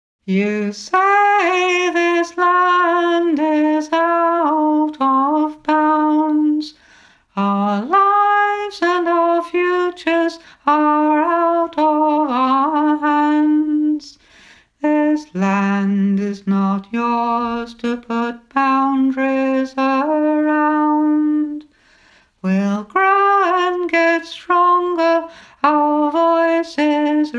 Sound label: Alto